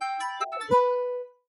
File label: Chore Started Lower Higher